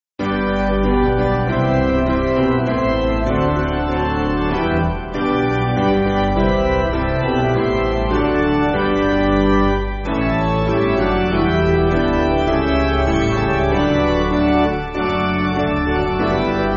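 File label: Piano, Music Backing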